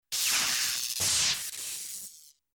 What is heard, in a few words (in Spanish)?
espada laser